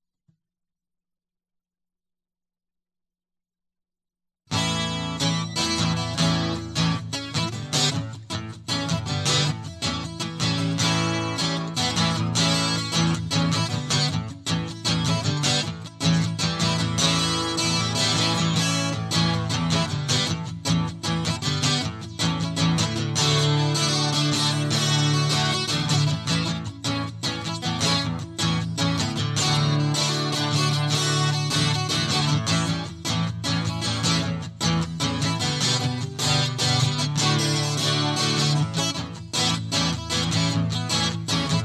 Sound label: Guitar Loop